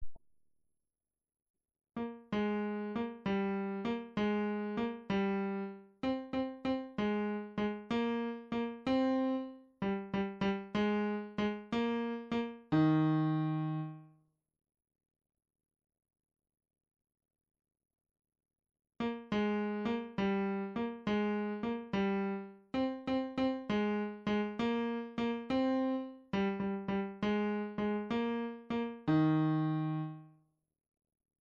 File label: flute 2eme voix; FLUTE 4 2eme voix; FAURE BENEDICTUS